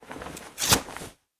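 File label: infantry weapons foley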